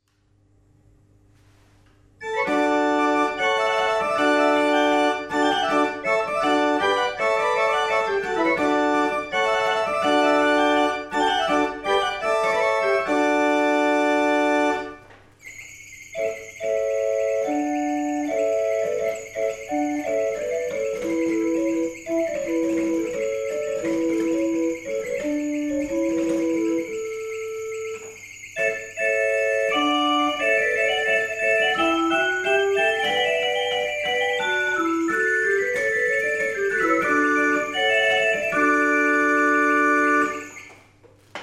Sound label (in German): Orgel, Rigaudon